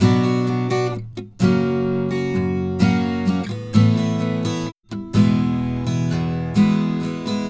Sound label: Acoustic Latin Guitar 128BPM Bmin; Acoustic Latin Guitars; Vengeance; Essential Clubsounds Vol.4; Guitarloops